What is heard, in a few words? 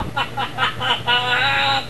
laugh lofi